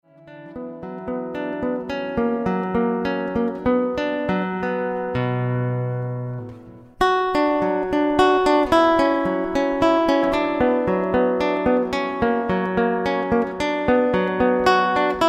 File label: study in b minor, guitar